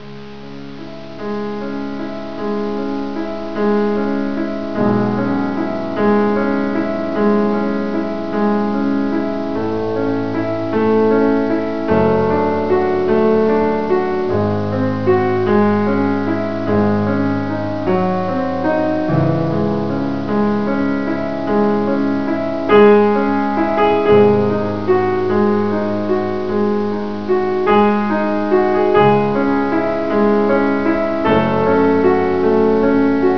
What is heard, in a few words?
moonlight sonata